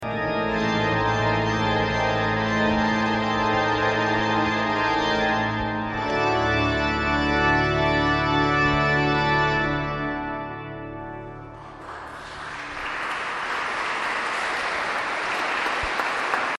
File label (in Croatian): zvuk orgulja; slavonija; orgulje